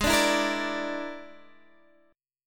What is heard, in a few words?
Bb 7th Flat 5th Ab